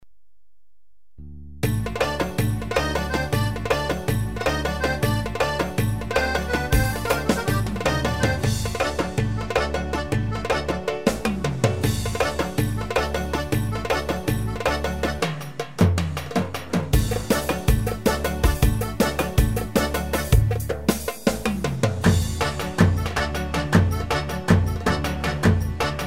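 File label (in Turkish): Giresun karşılaması, Ketron ritim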